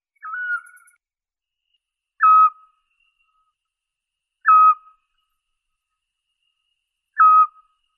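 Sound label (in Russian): сплюшка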